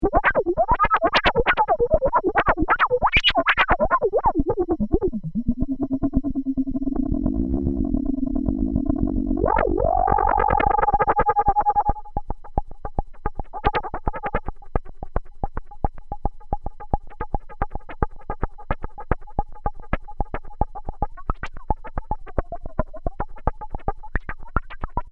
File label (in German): fm regiert